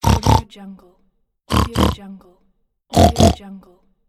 the pig

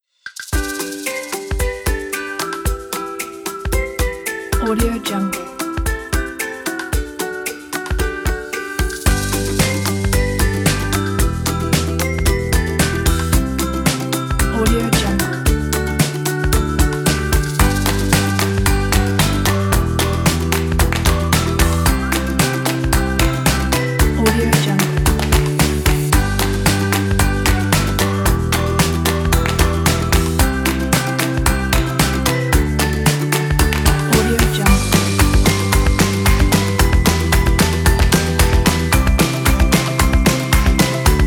ocean of fun and joy kit

